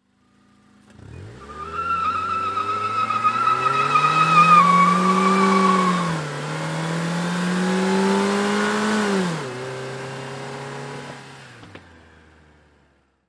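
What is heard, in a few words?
Small Car Accel Peel, compact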